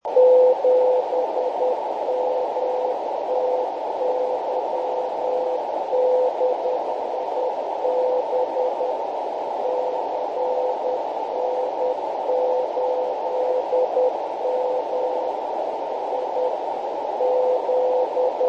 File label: beacon